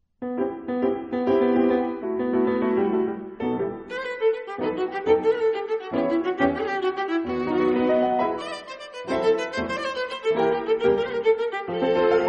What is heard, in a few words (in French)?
Soliloque alto piano; chambre